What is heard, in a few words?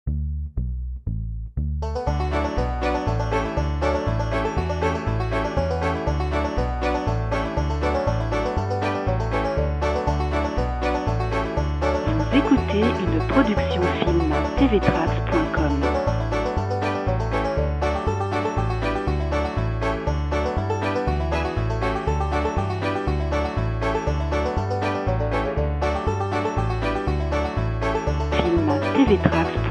Rag Square Dance Lady